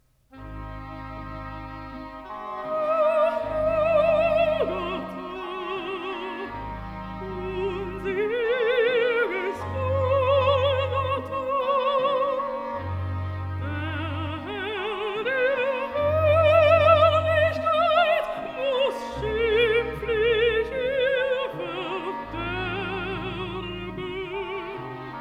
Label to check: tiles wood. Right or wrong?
Wrong